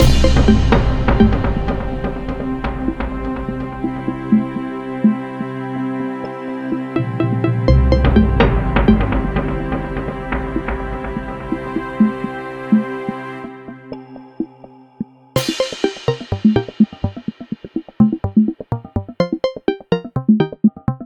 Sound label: Pig Temple Peace; Voidigo